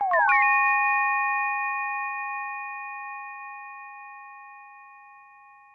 SYNTH riffz; Beautyon

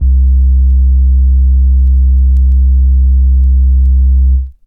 Synare Sub Clean Vinyl Synths C1

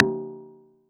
Rim Shot

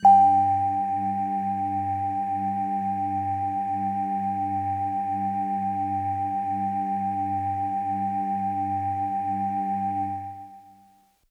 Bell Air Kawaii G-1

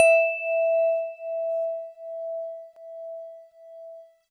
DX100 E5; GVibes; good vibes

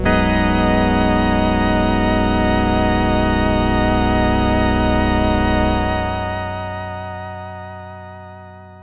Synth, Sword Fizh Splendor

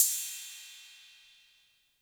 Cymbal 808 Tape Decay, Clean

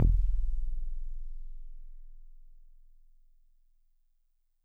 Solid Bass DX100 E1

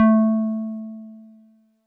Wood Piano DX100 A3

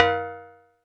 Cowbell

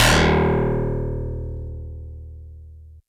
Harpsi FM DX100 Ds1

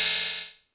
Hihat Open FX

